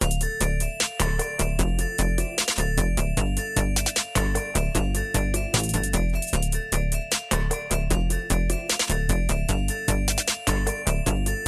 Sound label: game music action fast paced elelctro sonic; zapsplat